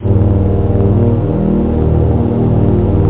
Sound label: Warm chord